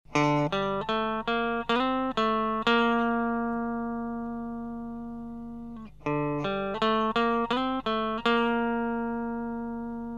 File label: Horizon, ESP Horizon, Clean Melodie